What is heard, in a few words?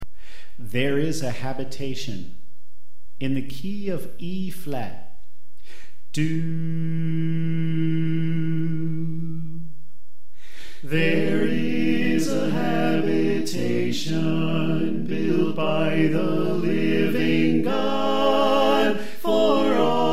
Alto Left